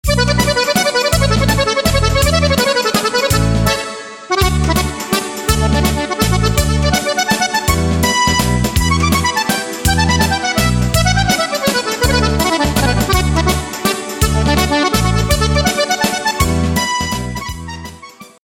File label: Mazurka